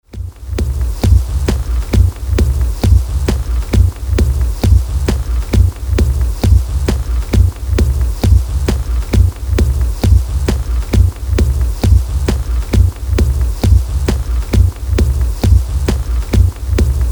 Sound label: Loop